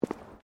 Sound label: Footsteps womens low boots concrete step; Footstep Foley; Footsteps (Sound Effects:Human)